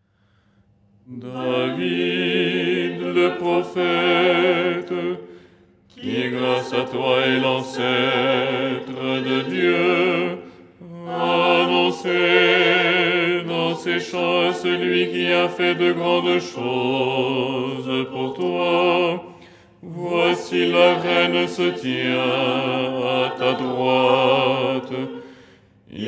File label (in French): basse; Théotokion dogmatique; Les 8 tons en français; ton 4